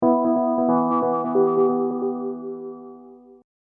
Hip Hop Dark Rhodes Licks 90 Bpm Swing E; Keyboard Loops; Lynne Music

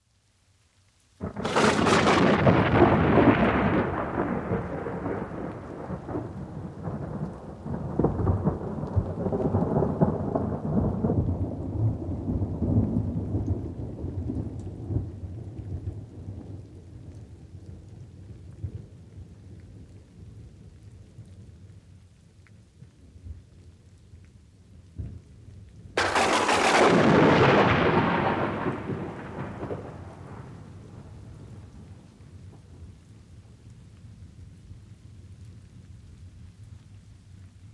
Severe thunder storm with light rain; Thunder (Sound Effects:Weather); BBC

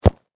Sports Soccer Ball Kick; Soccer Sound Effects; Soccer (Sound Effects:Sports); SFX Bible 6

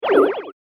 Phaser Stun Pistol; Laser Gun Sound Effects; science fiction sound effect

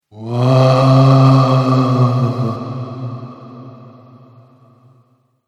Ghost Spooky Moan; Ghost Sound Effects; horror

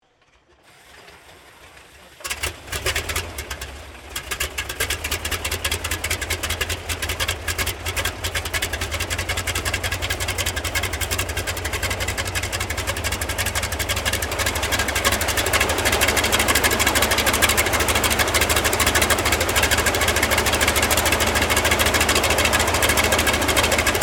AIRCRAFT CAC WIRRAWAY STARTUP STEREO; CA-16 Wirraway; Airplanes (Sound Effects:Vehicles)

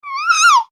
human baby 3 months upset yell; Babies

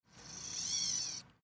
Sci Fi Servo Motor Small Robot; Robots

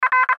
Radio Morse Code; Morse Code Letter R; Morse Code (Sound Effects:Electronics); Blastwave; Warfare

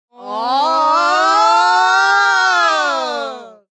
Yell Twins Oh, Female yell; Yelling; VoiceBox

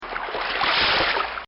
Walking In Water; Nature

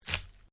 ORGANIC PUNCH; Various Organic Foley sounds; Combat Swings; Sound Effects:Combat; Foundation3

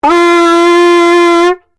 HORN TIBETAN SHORT, Ornamental brass horn; Horns; Musical

